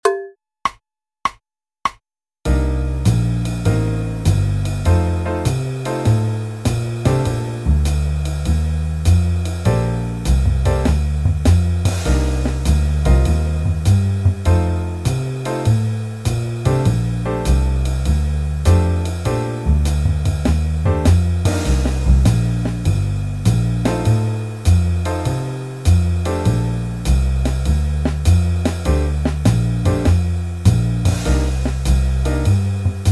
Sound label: Phrase 1 Loop in C